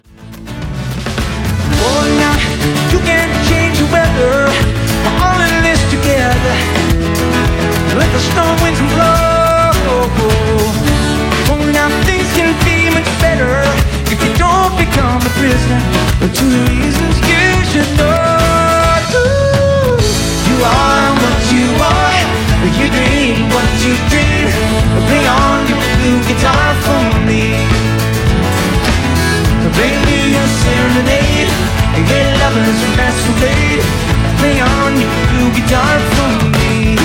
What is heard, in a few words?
Blue Guitar